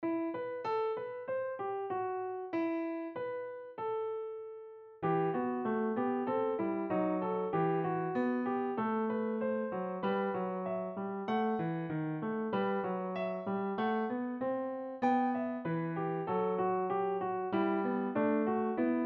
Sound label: 2 Part Invention e minor